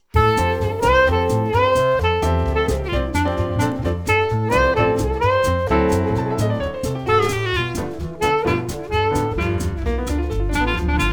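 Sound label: clarinet, jazz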